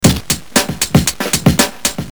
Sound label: Breakbeat